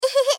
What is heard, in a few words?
Moe Smile Ehehe; Rei Yumesaki